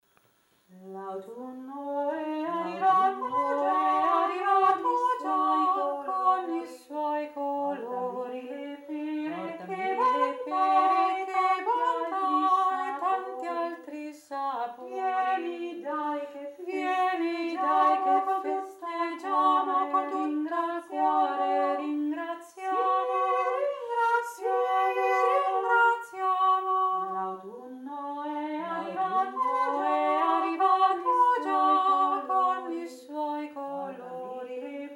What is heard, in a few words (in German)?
herbst autunno kanon